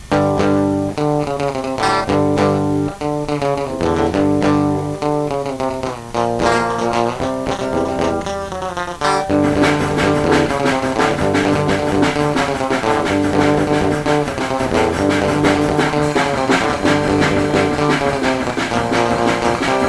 punk rock, abertura

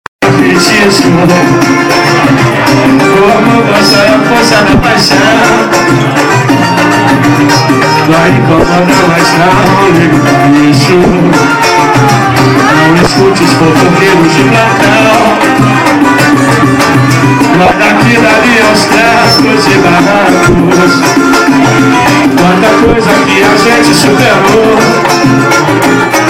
samba

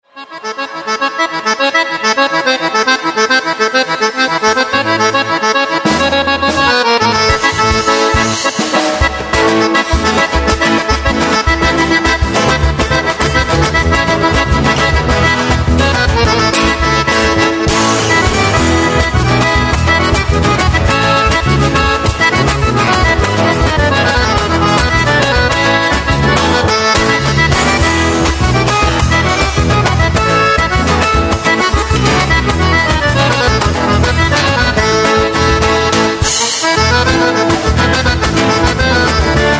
instrumental

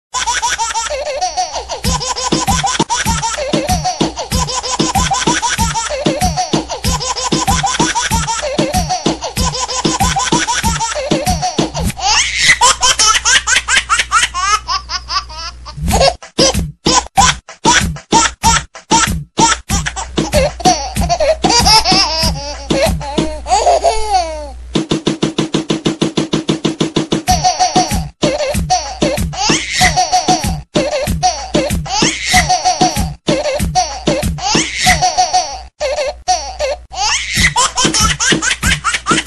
music

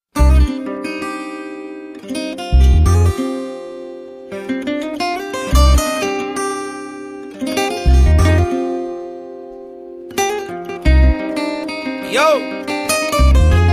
chorus mix

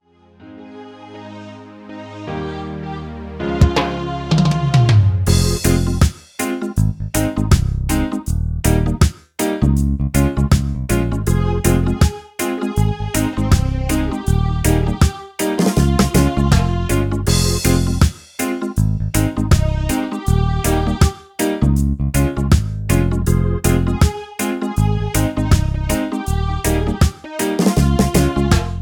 reggae

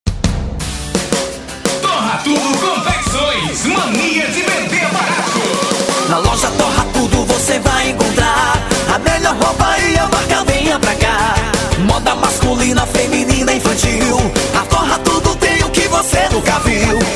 jingle

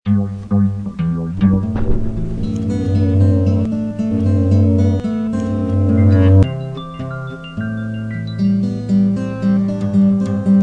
instrumental mix

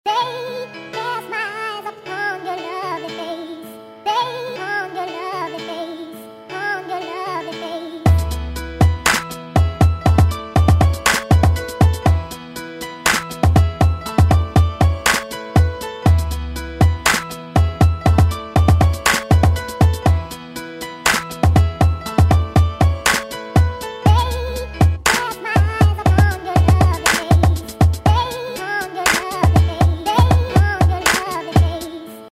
beat

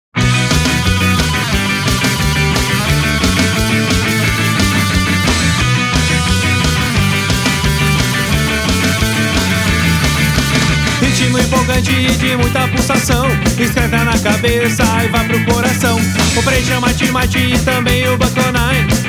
ska punk

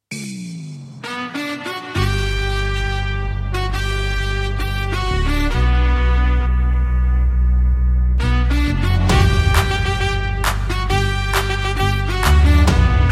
funk remix

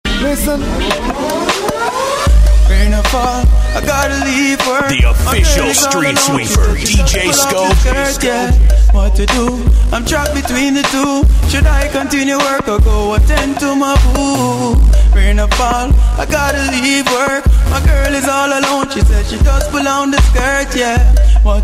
hip hop rain fallen

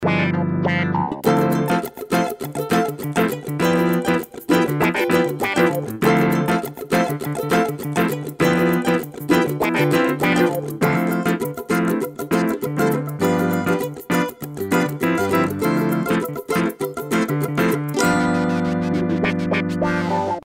groove new samba; trilhas para filmes

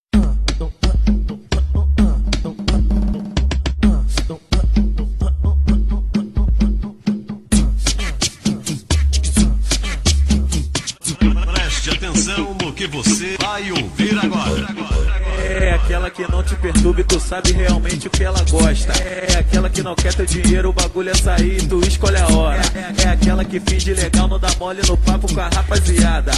funk

